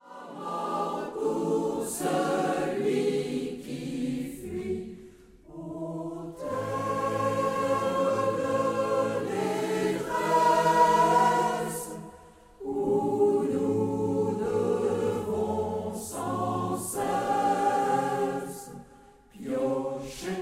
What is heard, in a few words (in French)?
le chant des marais, chorale populaire; voix rebelles